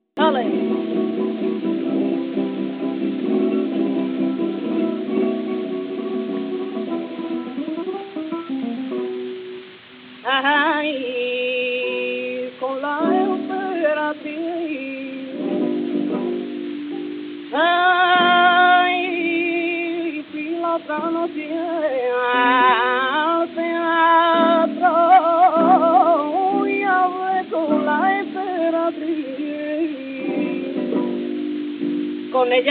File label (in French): taranto, chant, guitare; "Hablé con la emperatriz..."